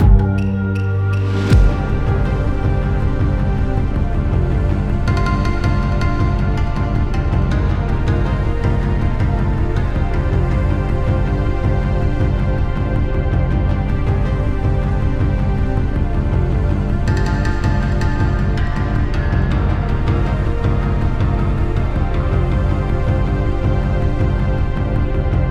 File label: Stage Clear Intro; Vengeful Guardian Moonrider